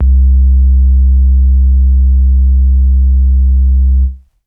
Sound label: SH5 Sub Triangle Vinyl Synths C1